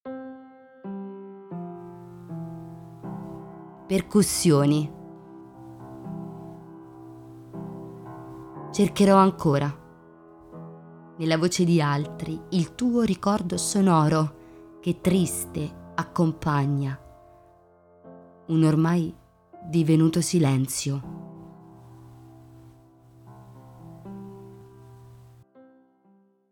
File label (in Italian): percussioni